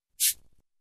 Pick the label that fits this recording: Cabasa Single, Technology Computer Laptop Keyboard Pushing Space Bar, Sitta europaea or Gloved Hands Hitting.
Cabasa Single